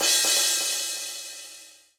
Crash; Equipped Music; Loungin House

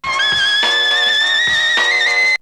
Melody loop; ZERO G - Datafile DISC 1

